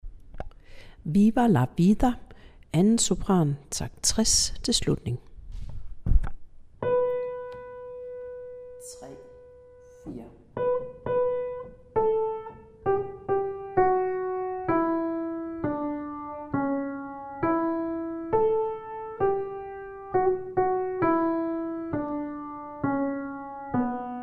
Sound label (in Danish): 2. sopran